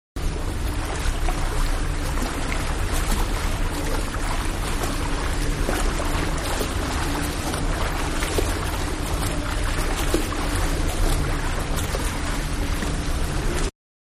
Swimming Diving Laps close up, Swimming Indoors-Laps-Close Up Fast With More Splash; Miscellaneous Sport; Manhattan Production Music